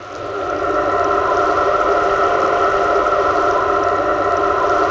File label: PHANT RAIN; Distored Reality